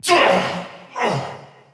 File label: Pain Grunt; Warframe; Nightwave; Season Three Glassmaker; Nihil Fight